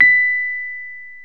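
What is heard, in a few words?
piano Rhodes Mk.V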